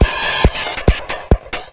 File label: drum loops, Techno, 138; xtra-drumloops